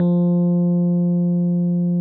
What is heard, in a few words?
RHODES F2; Sonic Reality - Vintage Timetraveler